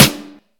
Noisy Acoustic Snare G Key, snare drum sound tuned to the G note